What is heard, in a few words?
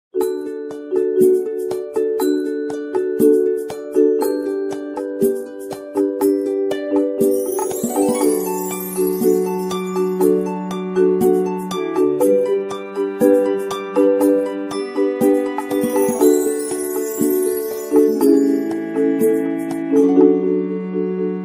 Fluttering Alarm Ringtone; OnePlus